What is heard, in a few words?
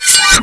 detach, waste scanner; npc; hl2mp